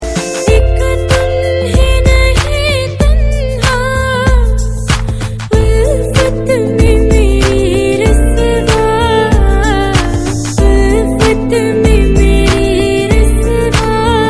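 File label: Love Ringtone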